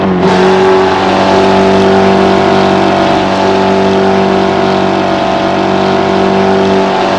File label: gear, vehicles; mitsuevox; tdmcars